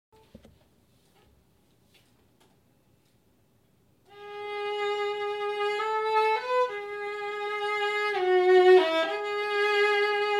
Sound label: Viola; Brahms